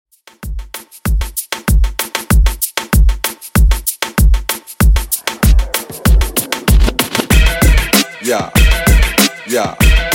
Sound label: Reggaeton, 96 Bpm